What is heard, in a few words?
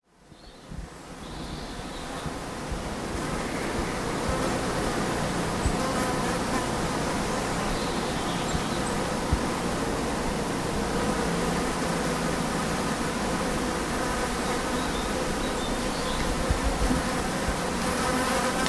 flies buzzing in the woods